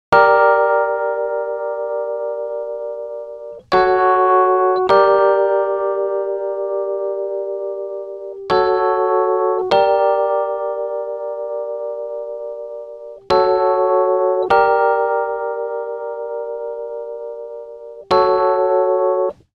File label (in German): moog multimode filter xl rhodes chorus hoher drive; universal audio